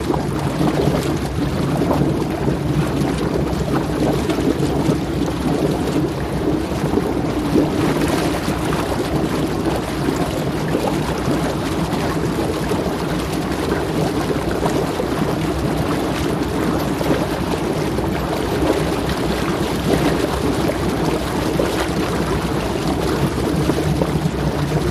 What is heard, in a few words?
Propeller Water Wash; propeller movement